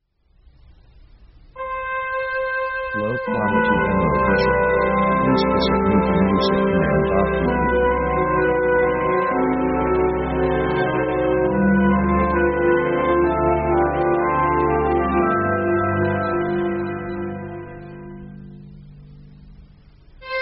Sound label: American Romance